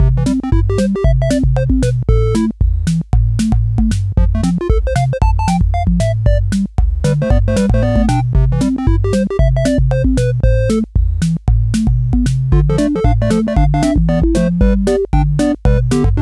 Game Background Music Loop; Clone Drone in the Danger Zone

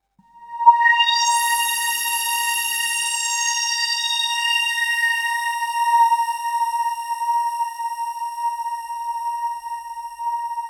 Oneshot Synth

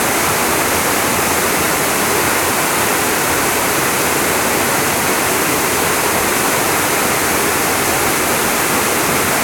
waterfall

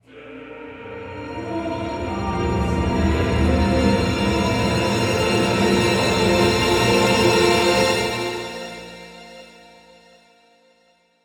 80BPM

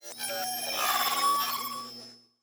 Data Calculating

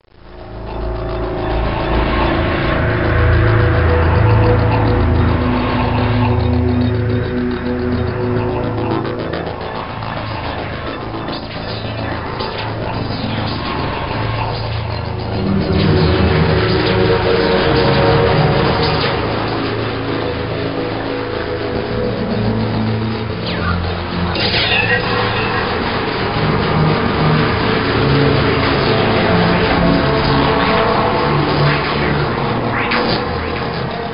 menu (ambient); Hunter Hunted

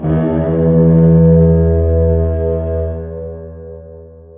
Horn

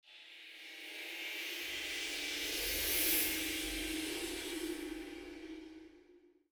Tension; Curtain Tension

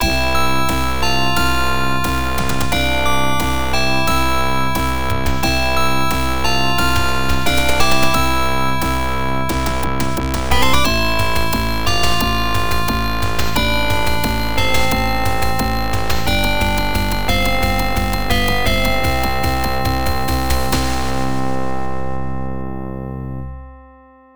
A Victorious Bell is Ringing; pc-88; Herzog

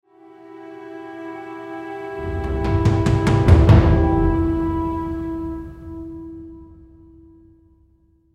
Perc Build

